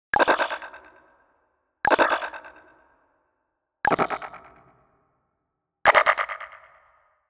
Digital Click Negative

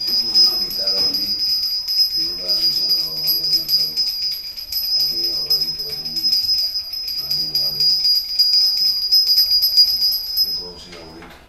cuba vodoo prayer; best service Blue Box

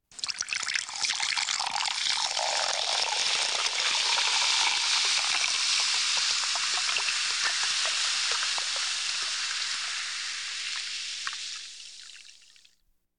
Household Phones

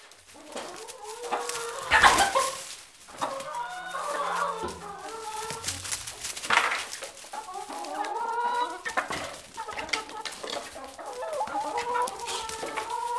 chicken getting exited; best service Blue Box

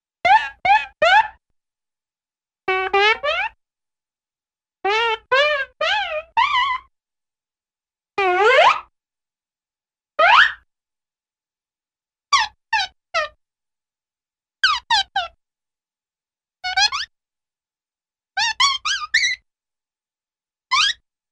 Laughing Singing Talking Steel Guitar Plucks; Cartoon; Hollywood Edge; Cartoon Trax

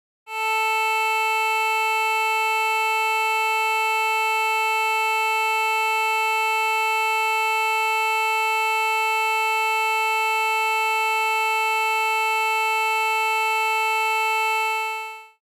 A-440 tuning reference; Alan Parsons & Stephen Court - Sound Check 1993

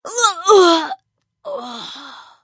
female pain death; firefight

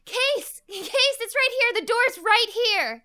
guide yell; blindside

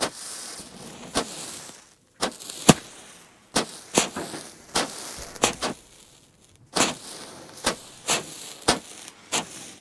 firework; best service Blue Box

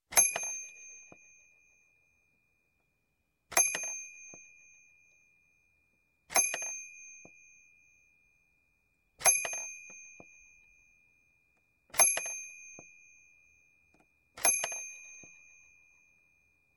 Toy Bell; Sound Ideas 2000 Series

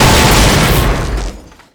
Explo Large Generator; Destruction

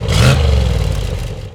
65 Corvette Rev; Automobiles

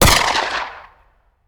Rifle; Sound Ideas; Guns

